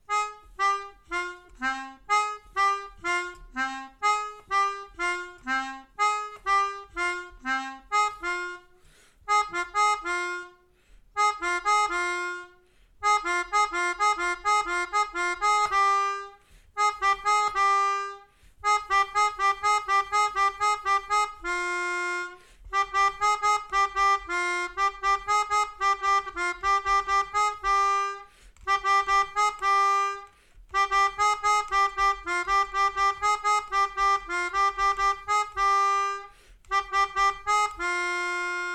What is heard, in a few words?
Melodica